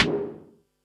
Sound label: snaredrum, Pearl SC-40